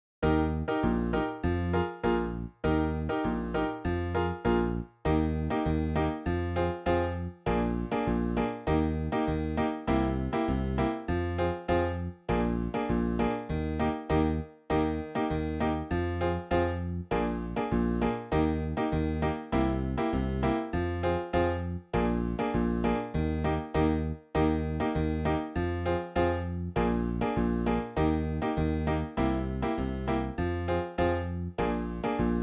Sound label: BACKING TRACK